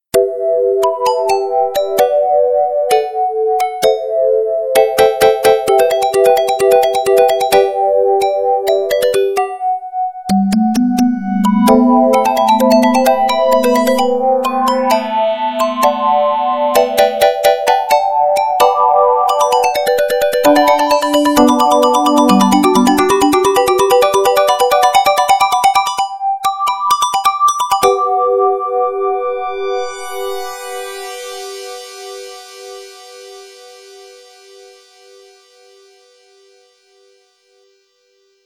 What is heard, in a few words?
piano; pianoAlfa